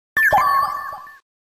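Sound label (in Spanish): Grito, Pokémon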